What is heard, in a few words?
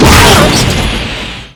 charge hit flesh; demo; slender